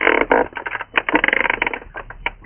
AMB MILL

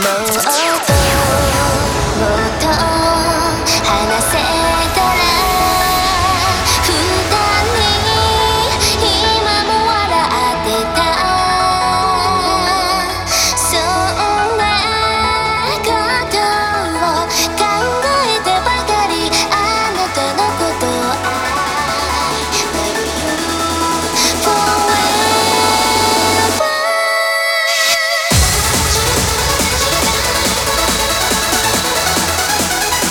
TRANCE Version; best of wing 2013; Three Magic

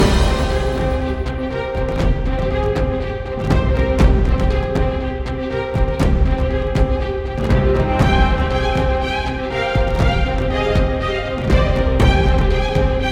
Winter Medium Threat Loop

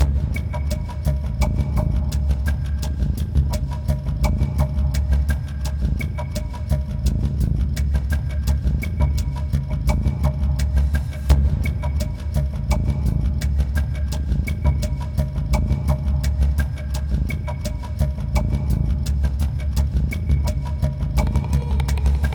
Timer; Mass Effect; Wards Timer II

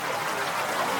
Background Sea

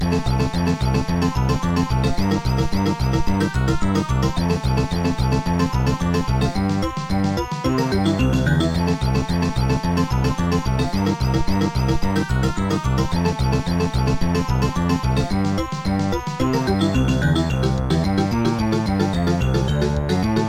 Spin And Win, pinball; Adventures of Rocky and Bullwinkle and Friends; Data East

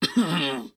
shield death

